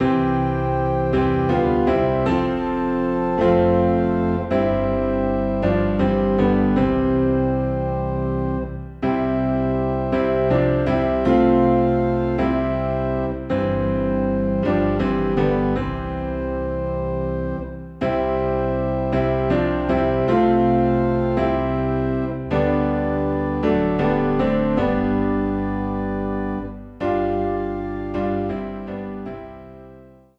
Piano and Organ Low in C; Take Time to be Holy

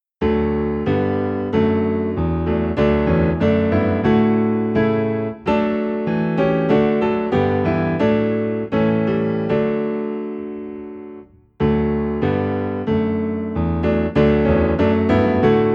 Piano Low in D, hymns; Guide Me O Though Great Jehovah

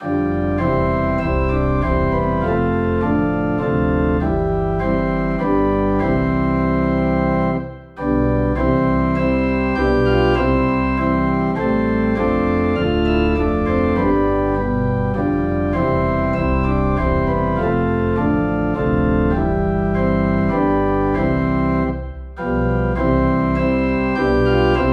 Organ Low in C; The Master has Come

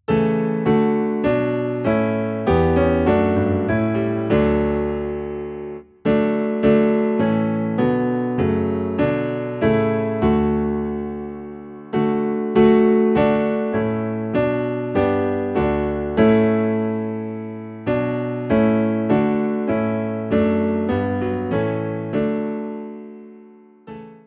Piano, Low in D; Praise God From Whom All Blessings Flow (Doxology)

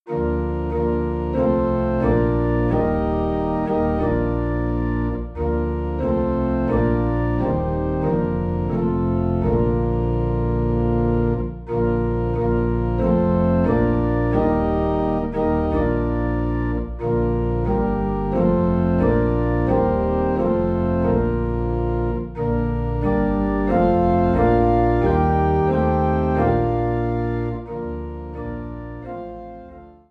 Organ Low in B Flat; We Believe In One True God

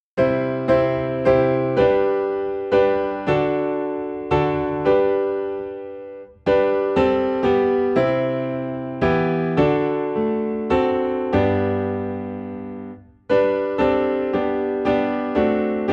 Piano in G; Faith of Our Fathers

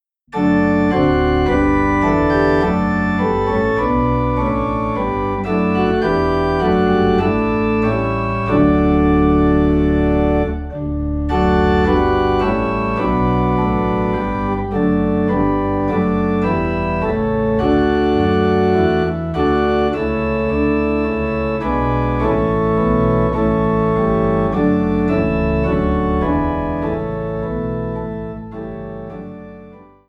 Organ Low in D

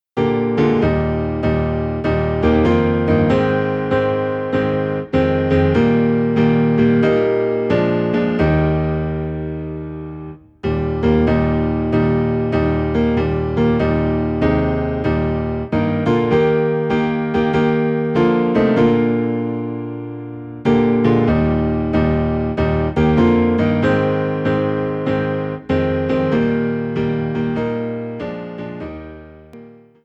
Piano Low in E Flat; Are You Washed In the Blood

